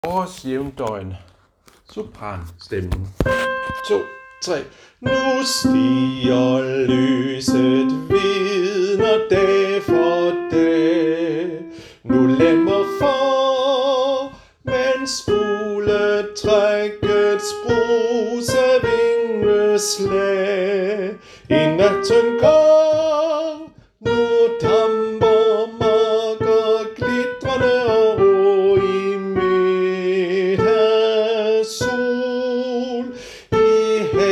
sopran; harlev koret

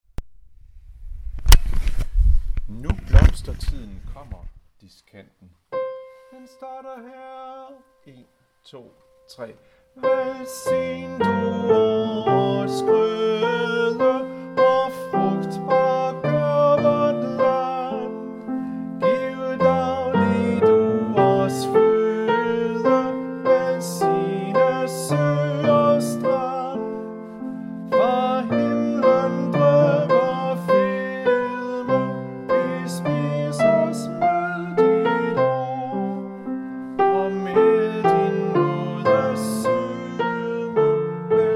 diskant, harlev koret

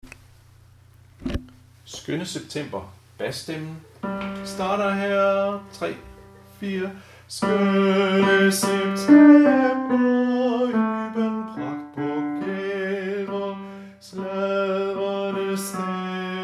bas

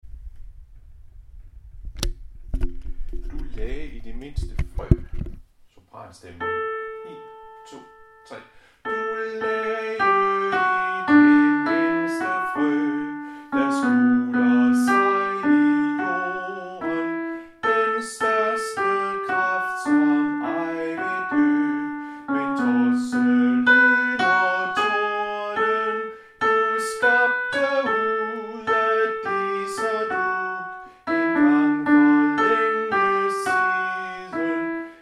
sopran

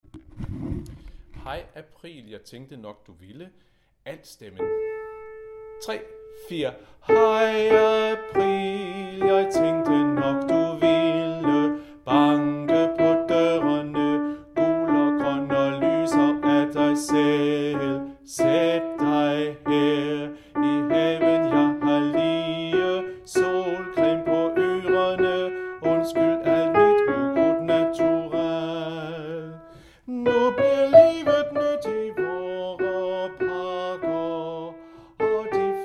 Hej april! alt; harlev koret

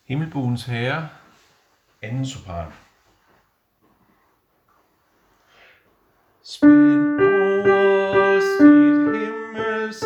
2. sopran; Himmelbuens herre; harlev koret

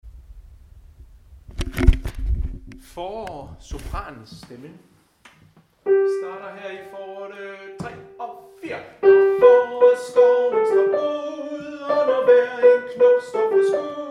sopran; harlev koret

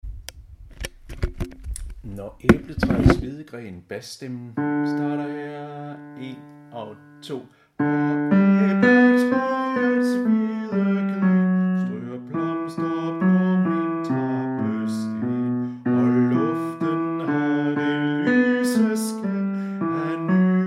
bas

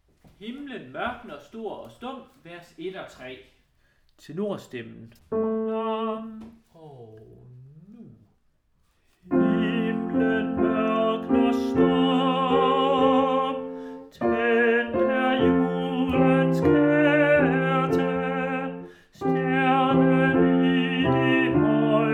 tenor; Himlen mørkner

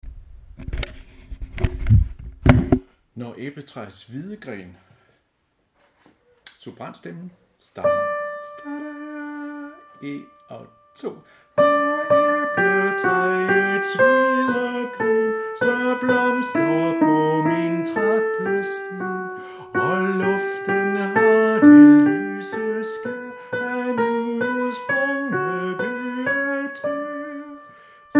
sopran

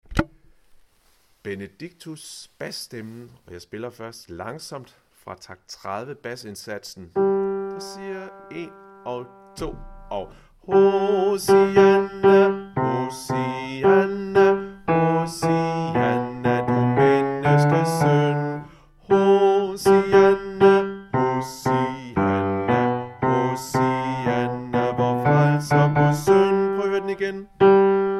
bas; Benedictus; harlev koret

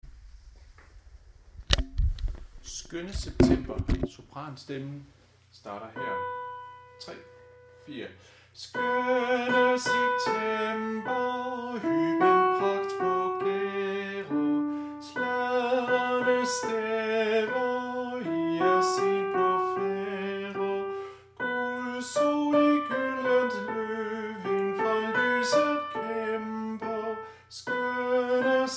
sopran, harlev koret; Skønne september

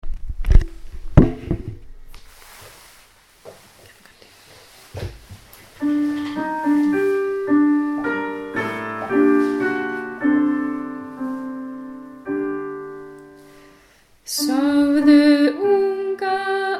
synger islandsk sang; harlev koret

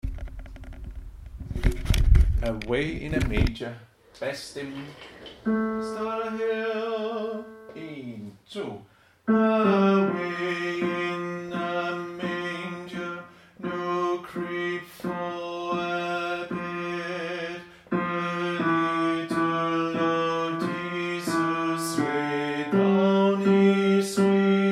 bas; Away in a manger; harlev koret

